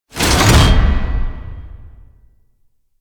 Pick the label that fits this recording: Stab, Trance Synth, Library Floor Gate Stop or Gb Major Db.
Library Floor Gate Stop